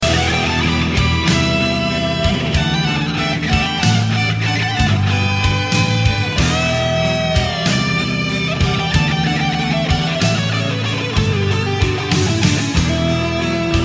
Home Riff

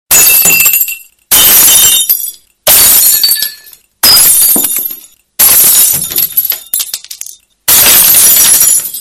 Glass Break

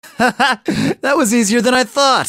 Laugh; Joker

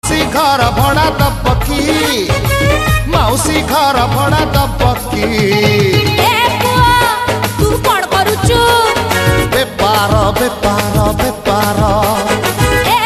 Oriya Tune

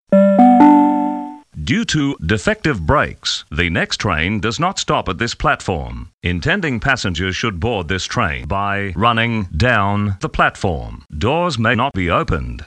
Defectve Brakes

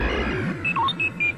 R2D2; Lucasfilm Anima

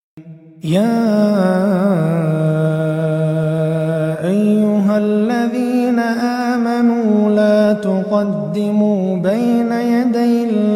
Quran

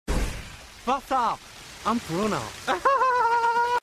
Laughing

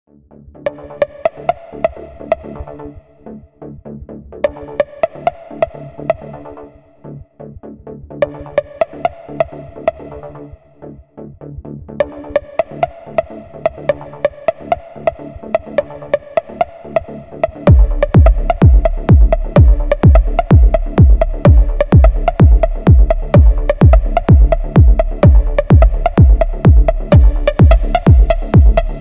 Nice Bass

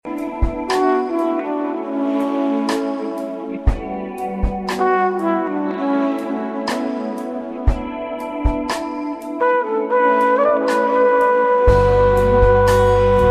Soothing Sound

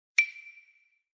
Wood Block Msg

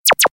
Lazer Double Fire

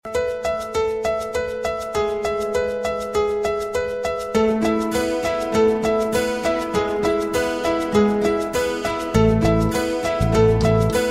Smooth Ringing

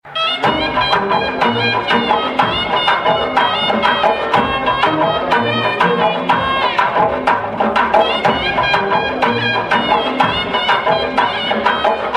Tone; Avan Ivan

